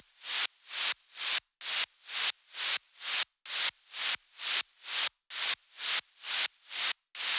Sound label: 130BPM Kit; VFH1 130BPM Tibet Kit